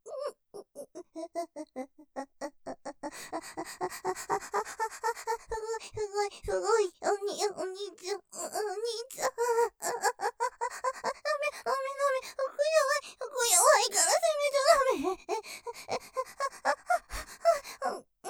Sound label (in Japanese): 喘ぎ, すごい、すごい；；ダメダメぇ；；; ステレオ